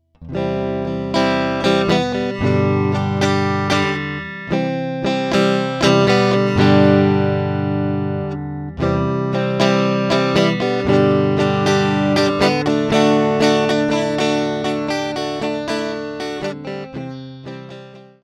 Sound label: Git Pure; Steinberg UR24C